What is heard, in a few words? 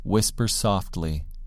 whisper softly